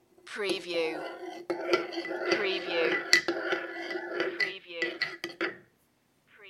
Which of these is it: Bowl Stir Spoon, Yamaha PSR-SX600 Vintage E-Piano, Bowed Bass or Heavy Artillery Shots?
Bowl Stir Spoon